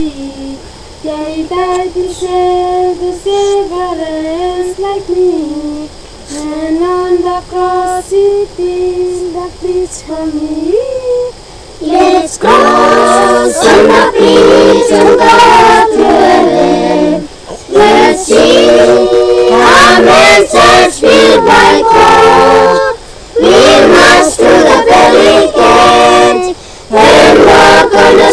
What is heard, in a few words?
children, Vanuatu; rock